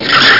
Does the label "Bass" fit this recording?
No